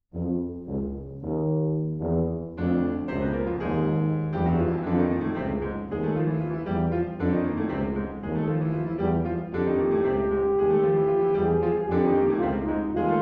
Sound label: Suite For Horn Tuba and Piano; 1. Chacona Mulata